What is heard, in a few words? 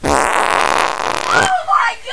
fart